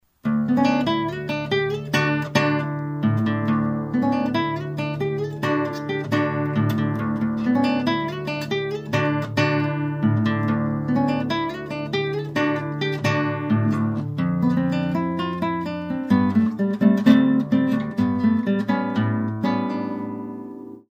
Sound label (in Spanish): guitarra